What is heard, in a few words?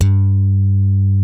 DYNA MID G3, DIGITAL BASS; Music Store - Claudius Diemeter Schwerlos